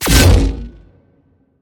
combo swing; smite; god arthur

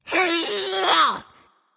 nv grunt lift; cupid

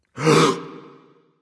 breath; smite; agni

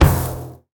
Hit Chest; smite; kumbhakarna

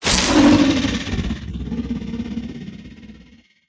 activate; Smite; god Kukulkan v5

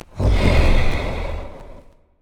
Victory Monster Vox Emerge; Smite; Susano

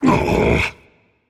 ugh rage; chaac

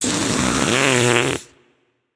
tongue; smite; voicepack; tiamat

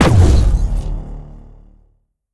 atlas throw; smite; god atlas

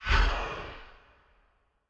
fire; smite; hades

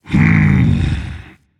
thinking rage; chaac

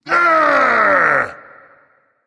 yay; smite; sobek

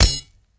mvt sword drop; smite; gilgamesh

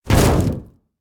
bulk metal hit; Smite; Cabrakan

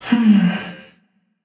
sigh, voicepack; smite; jorm

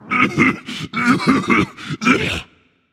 clear throat; smite; ahmuzencab

